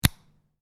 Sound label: Lego Connect Two Lego Pieces; Miscellaneous Toy Sound Effects